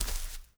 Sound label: Foot Grass Walk; We Happy Few